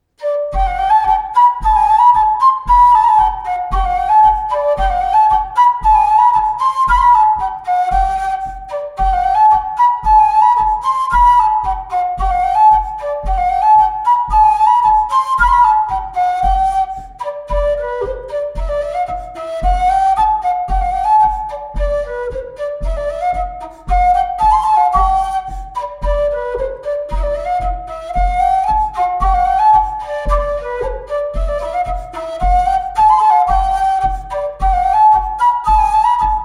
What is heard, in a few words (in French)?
Bourree; Ms Aurillac